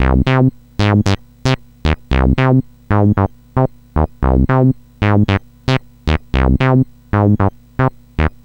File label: C64 Bass, Commodore 64, Chiptune; 8-Bit